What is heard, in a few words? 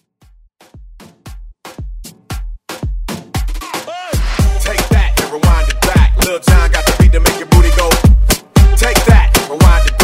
Moombah Mash Up Redrum Hype